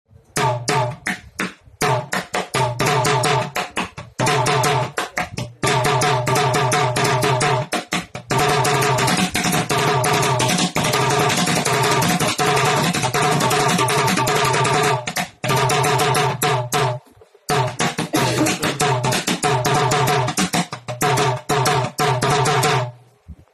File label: Kassoora, ساز